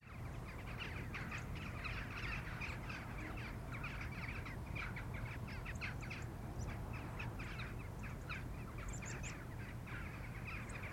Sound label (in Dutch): Dwerggors; De Nolle Thomas Luiten